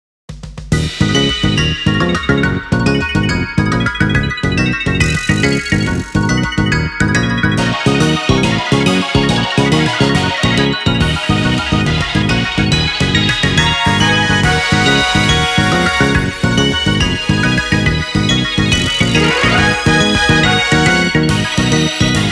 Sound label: instrumental